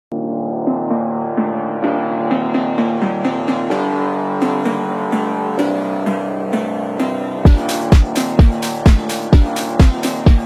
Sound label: Slowed + Reverb, Phonk